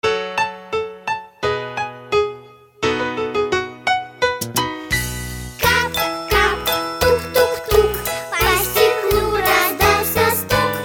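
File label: Children's songs; Dozhdik Kap kap tuk tuk tuk; 2 klass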